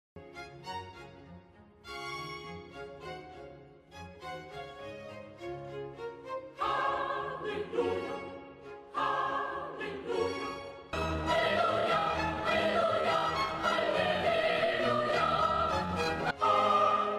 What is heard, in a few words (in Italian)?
confronto diapason 415 e 440; haendel